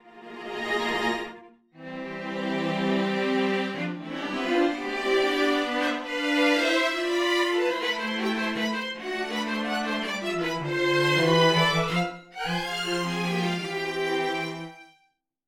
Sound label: Scoring Strings